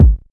natural bass drum sample A key, steel kick drum tuned to the A note; kick drum samples